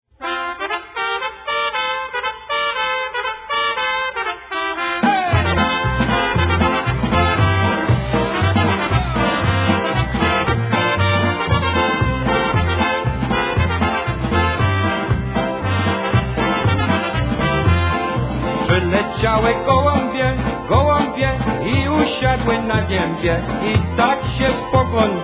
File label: Golden Brass Polka